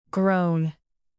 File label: groan